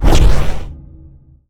message close fast

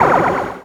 orb absorb; weapons; dota; medusa

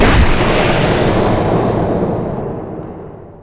iron cudgel; weapons; dota; monkey king

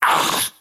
skywrath crown pain; skywrath mage

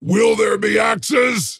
move pain; dota; axe

